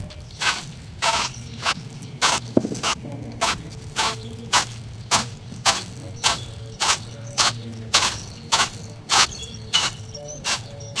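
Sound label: medieval fieldwork ambience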